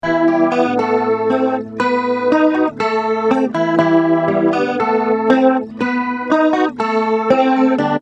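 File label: acoustic guitar ensemble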